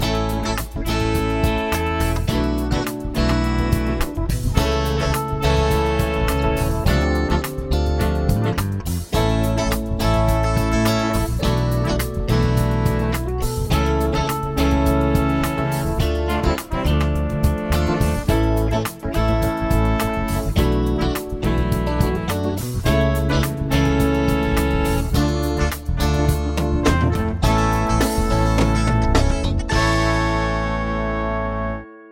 chord prog with mixture

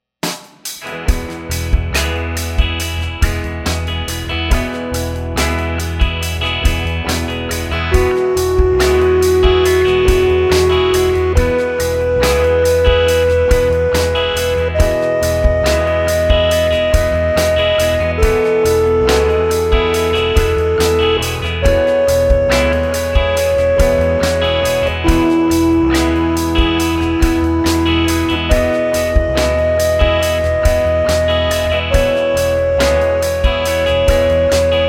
scale degree

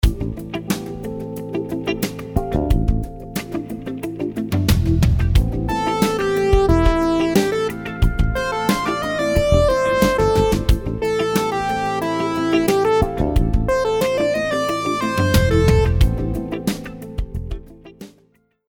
rhythms 16s syncopated

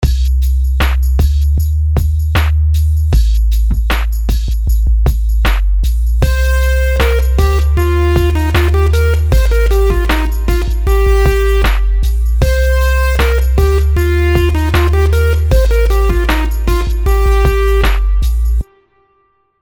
melodies simple 16s Cmin; melody

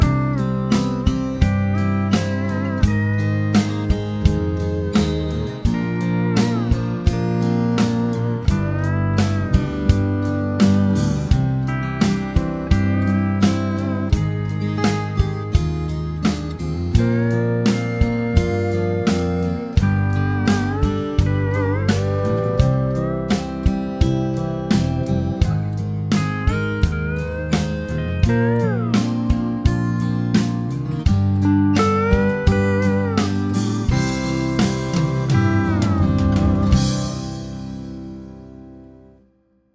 chord prog with mixture

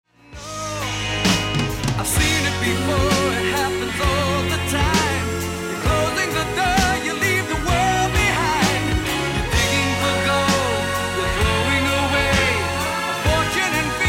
4-4 half-time shuffle; rhythm; meter id

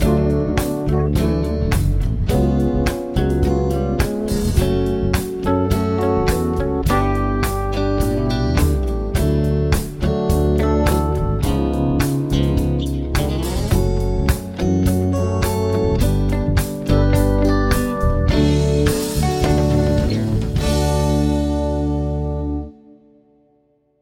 progs with applied chords

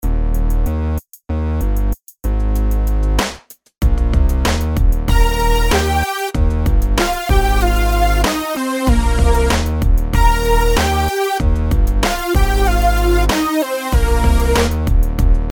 melodies simple Ebmaj; melody

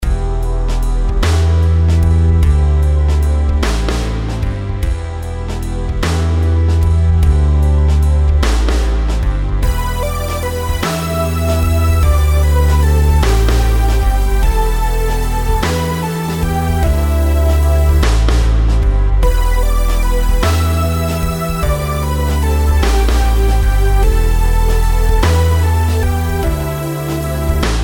rhythms compound 8s

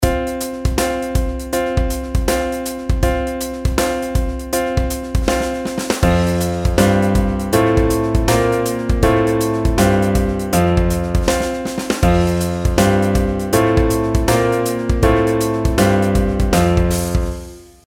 pitch patterns stepwise

